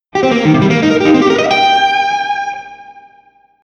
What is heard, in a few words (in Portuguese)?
Tetrade de Cm7 Arpejo, guitar